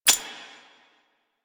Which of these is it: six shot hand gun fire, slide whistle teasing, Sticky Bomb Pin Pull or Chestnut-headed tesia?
Sticky Bomb Pin Pull